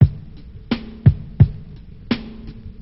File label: blues beat